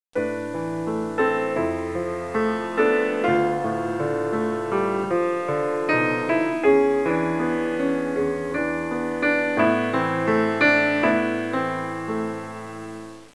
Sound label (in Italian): suonerie classica; musica classical